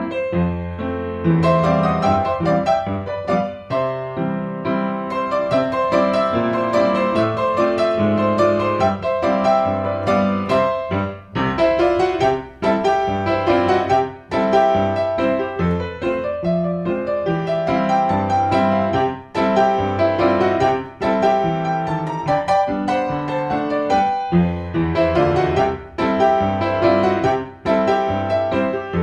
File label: entertainer 1920s